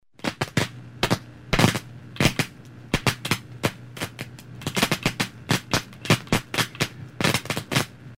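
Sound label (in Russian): zamykaet el zvuk, electrical short circuit sound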